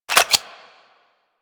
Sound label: Clip Out, Weapons; Warframe; Afuris